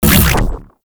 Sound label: Mutalist Big Land Scream; Warframe; Proto Infested; Abilities